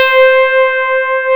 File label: RHODES C4